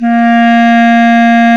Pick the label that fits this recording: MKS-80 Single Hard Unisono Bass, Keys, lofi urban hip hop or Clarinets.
Clarinets